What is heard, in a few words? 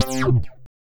Synth Hit C